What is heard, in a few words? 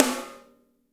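piccolo snare right, NATURAL KIT; Spectrasonics; Burning Grooves CD2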